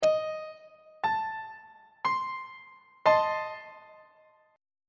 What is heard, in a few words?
g double sharp diminished 2nd inversion on treble clef